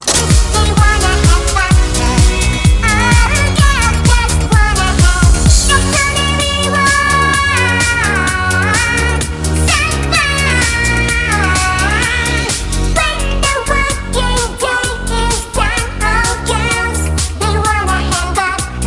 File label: Funky Music; Rayman Raving Rabbids; PlayStation 2